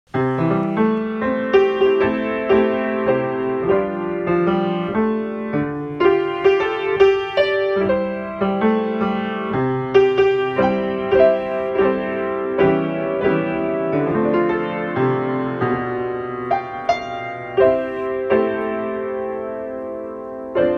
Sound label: steinway